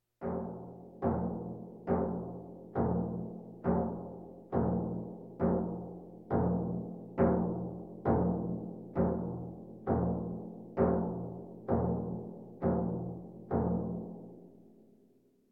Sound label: Percussion Bongos Tympani rolls Gongs Drums